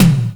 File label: ELECT TOM; USB Soundscan vol.27; Ragga & Dub Reggae; AKAI